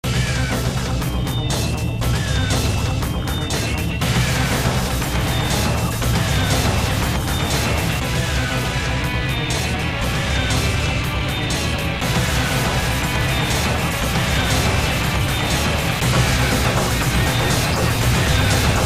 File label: Easy Noise Cut